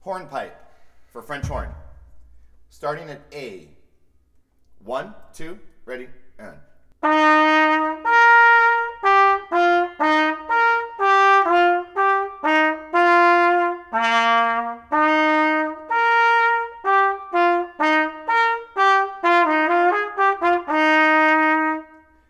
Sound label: Hornpipe Solo Only, F Horn; Solo Accompaniments; Handel-Ployhar